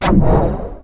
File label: message receipt; Symbian; N-Gage